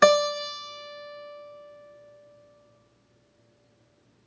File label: string 1 fret 1